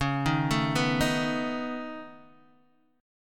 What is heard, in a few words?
Db 7th Suspended 2nd Sharp 5th Db down Guitar Open D